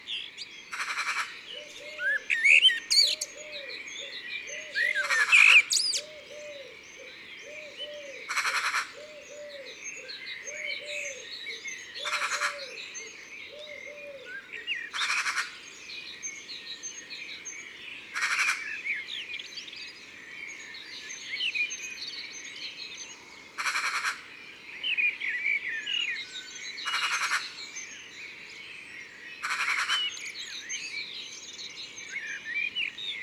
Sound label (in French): Pie bavarde, Concerts Naturels de la Drome; Studio les Trois Becs